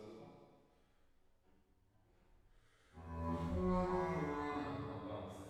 Bass

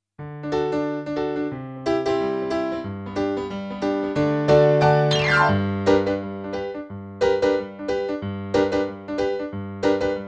Piano, RNR for piano

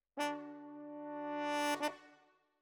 Horns

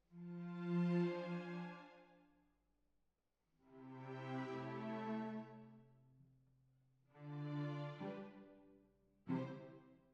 Cbasses

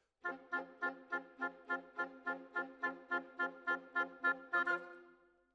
Oboe

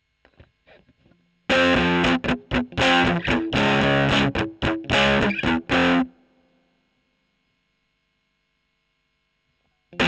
E.GTR Gefell

